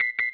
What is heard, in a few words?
cembalo